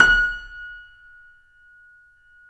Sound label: C7 F, The Ultimate Piano Collection; East West